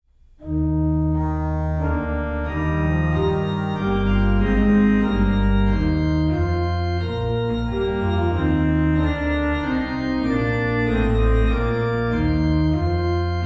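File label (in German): Wie schön leuchtet der Morgenstern, Bach Organ Works Chorale Preludes; Individual Chorale Preludes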